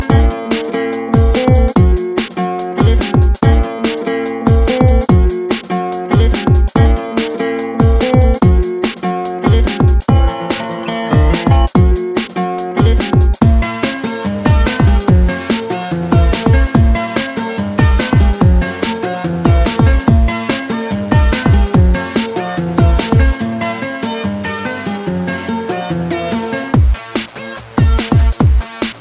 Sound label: guitar mode